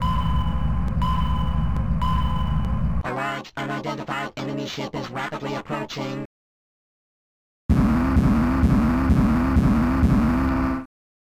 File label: Warning!; A Large, Unidentified Enemy Ship is Rapidly Approaching!; Battle Shark arcade